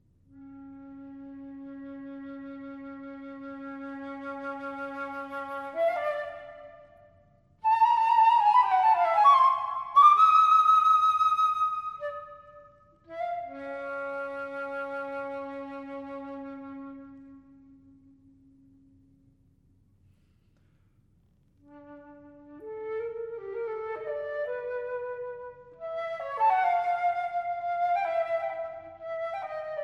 Pieces For Flute Alone, Sehr langsam, frei in Zeitmass